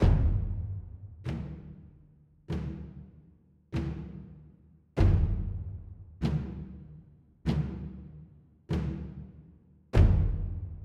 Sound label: Hits march; raji an ancient epic